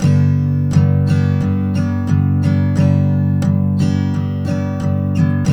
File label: TK MJ G#2, Guitars; Spectrasonics; Hans Zimmer Guitars VOL-1